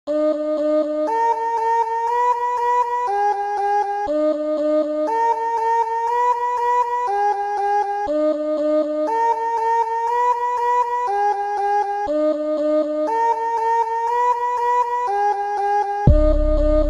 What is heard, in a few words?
instrumental